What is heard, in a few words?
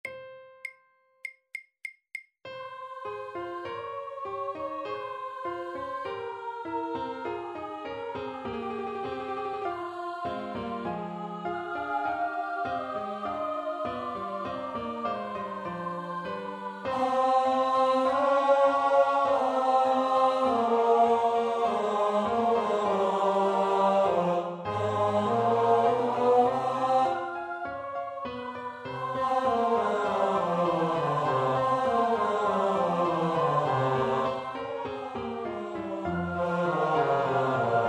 Amen Bass Slower; 13. Amen (Bass, slower)